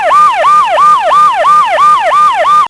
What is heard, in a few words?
Police Weo Siren Close; cars; Max Payne